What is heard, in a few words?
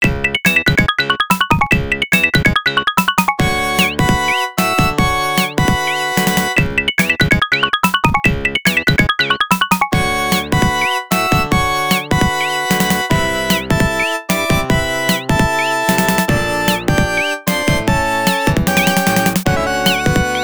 Bonus Stage; Breaking Boards; Street Fighter; arcade